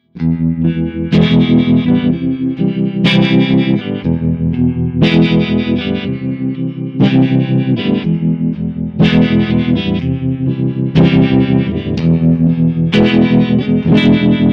Crazy Tube Circuits White Whale Effektgeraet Trem Harmonic Hard Rev Medium

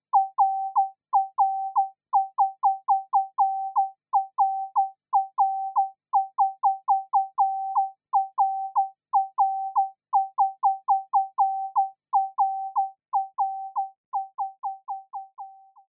Serge Filterresonanz; Kobol

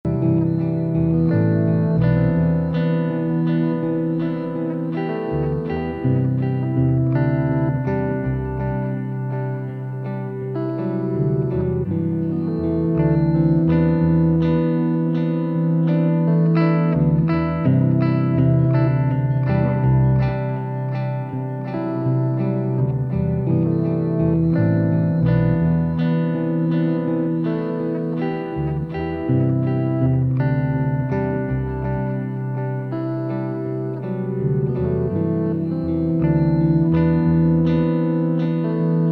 Golden Master Loop mit EQ Comp und m side Settings; Endorphines

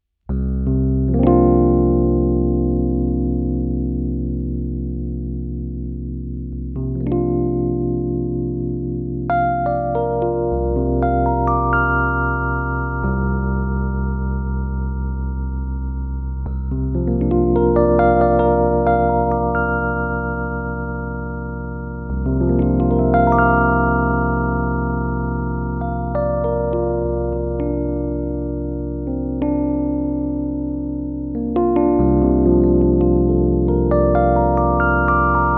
Ferro 4 Tremolo alternierend Sinus

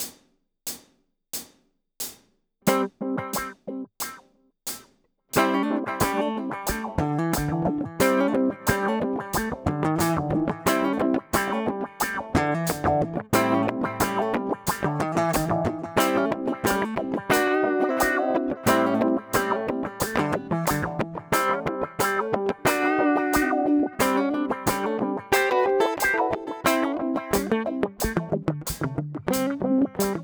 Coole Rhythmen mit Delay 90 BPM Duck; Guitar Rig 7; The Key

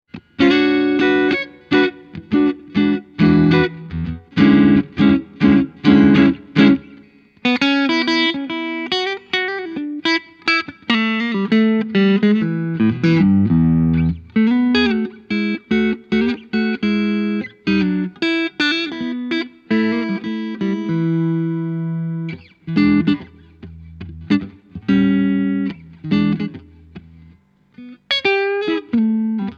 Hals clean; Sire Larry Carlton T7

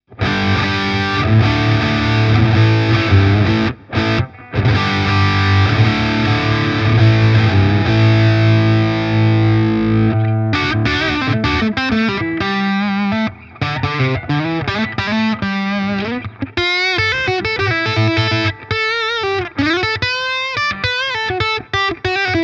Harley Benton SC Custom III Steg verzerrt Coil Split

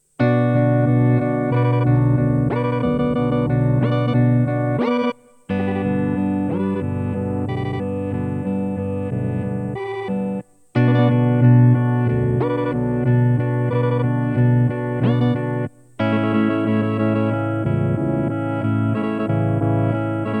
Chase Bliss Onward Glitch langsamer mit Pitch Error

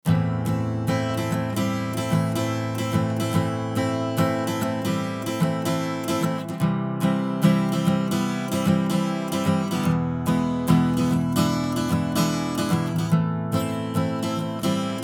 Gitarre EQ Tube; Gyraf Audio G14-S

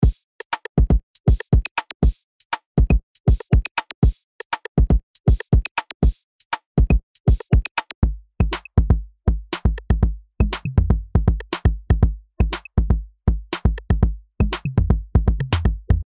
Drumloop pur

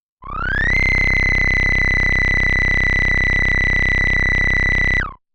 Filter Resonanz Flattern; Behringer K-2 MK 2

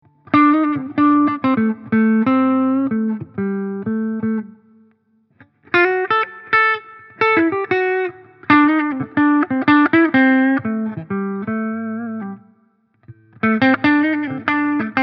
alle 5 Positionen; Yamaha Revstar RSS02T; Focus Switch

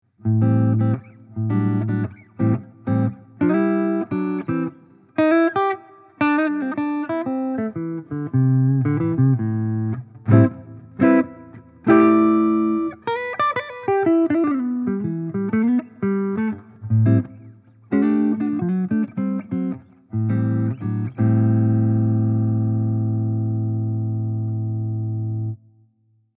Yamaha Revstar RSS02T clean Halstonabnehmer Focus Switch

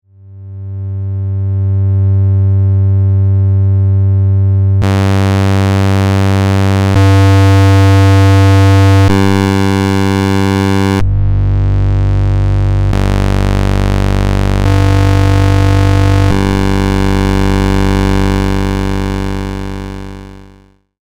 Wellenformen Oszillator 2

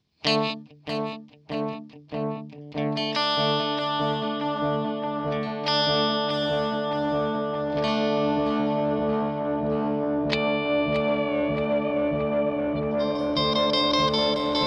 Silos Delay Tape Mix 11 Uhr Repeats 5 Uhr; EQD

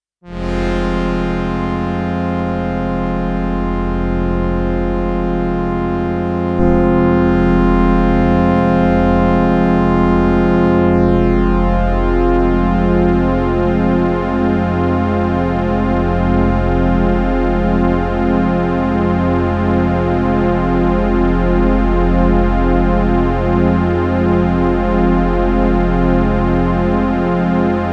OB-X8 Volle Breite

